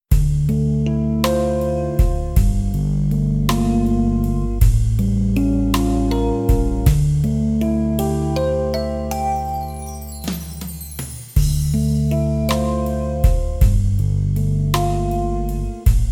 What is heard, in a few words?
Marians Lament Instrumental